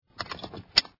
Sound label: belt